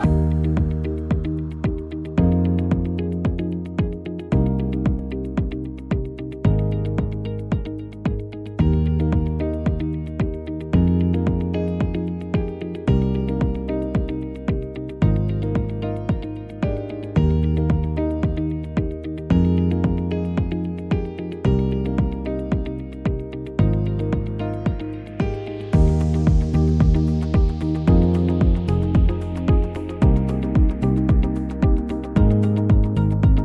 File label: Bg Corporate Inspiration; Coloring; Bubbu School; Android